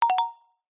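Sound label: multimedia game sound mallet notify exclamation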